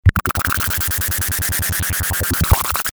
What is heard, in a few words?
burst noisy modulated